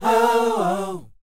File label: SHOO B C, R&B Dance, vocal; Spectrasonics Vocal Planet